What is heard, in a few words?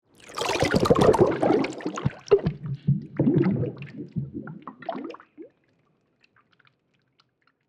Cinematic experimental water texture